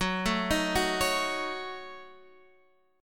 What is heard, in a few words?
A 6th Flat 5th Gb, guitar